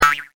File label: TWINGY